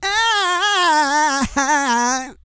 SING, Vocal Planet R&B & Dance; ILIO

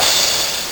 LSDj DMX, Nintendo Gameboy; 300 Drum Machines

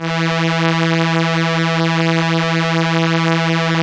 STR ELKA, Elka Strings, Elka Violins; Roland LCDP09 Keys of the 60s and 70s 1

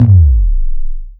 KICK -L, Disco House; USB Soundscan vol.20 - Fresh Disco House I

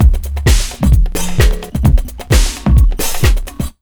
JUMP 130, Big Beat Drumloops

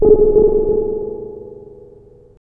DISTANT ECHO, ANALOG ECHO; Zero-G Ambient 1

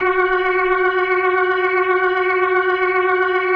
B3, First Call Vintage Keyboards

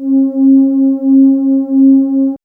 MOOG; AMG - Vince Clarke Lucky Bastard

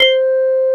Korg CX3, C 1

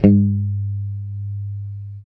A3 sus, Strat guitar; Spectrasonics; Hans Zimmer Guitars VOL-1; JC-STRAT MTE

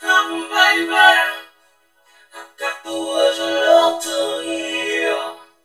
COM BABY, VOCODER, Funky Vocals; USB Soundscan vol.18; AKAI

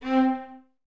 String Ensembles, Violas, VLS Detache

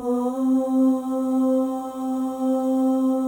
OH, FEM, Symphony of Voices

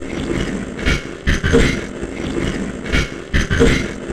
FX Loop, Drums & Drones

